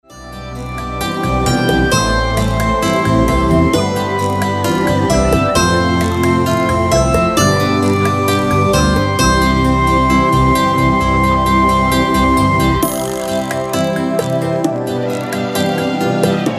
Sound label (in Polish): muzyka bez oplat